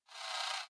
title move right

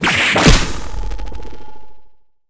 Punch

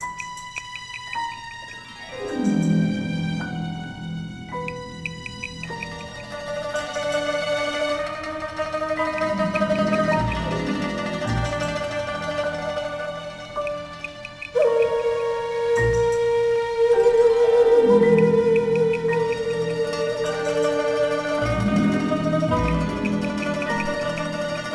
BGM Desert World Map; Level Guji

